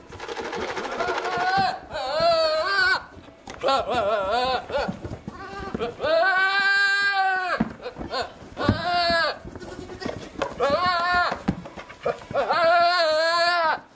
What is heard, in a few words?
argh